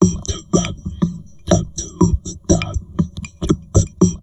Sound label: TALK, Drum Loops Crazy Processed